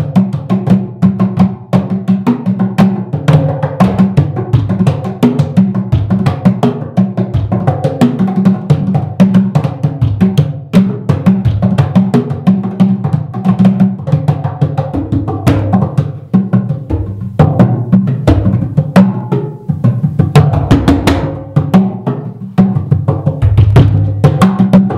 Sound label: Drums